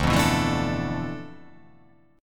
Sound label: D Minor 6th Add 9th D